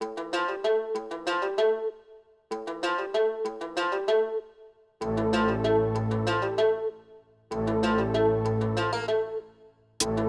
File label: 096 Amin, Hip Hop Exotica